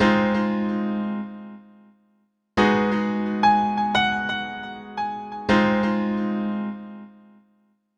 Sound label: D&B Keys Loop; neuro